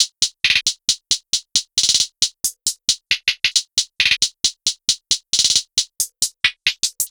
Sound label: hihats command WET; metapitch